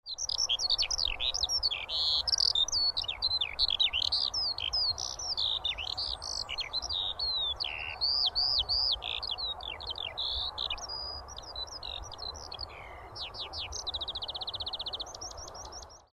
skylark

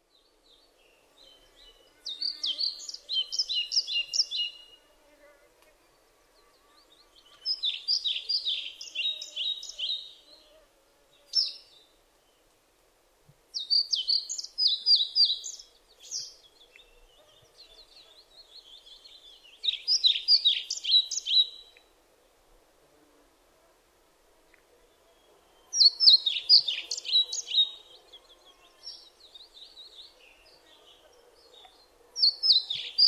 pied flycatcher

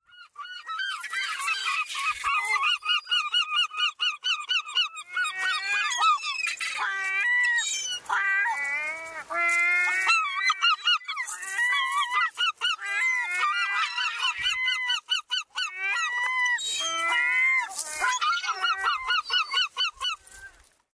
herring gull

